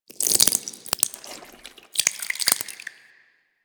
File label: Proto Infested Ancient Taunt Flesh; Enemies; Abilities; Warframe